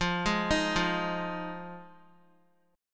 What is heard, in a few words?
F Minor 7th, down Guitar; Fm7; arpeggiated; 4F,4A,5C,5G,5C,6E